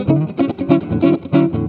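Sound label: JAZZ GUIT GROOVE; Best Service; World Colours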